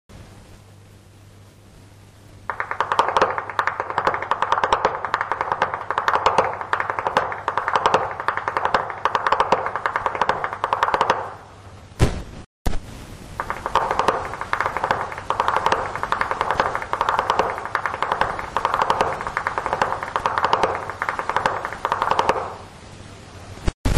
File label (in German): schnalzen; saaldorf